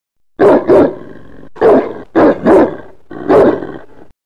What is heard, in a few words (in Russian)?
cartoon dog lai